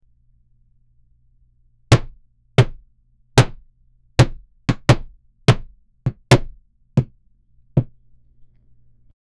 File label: a giant hammer pounding